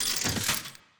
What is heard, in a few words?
reel nudge; netent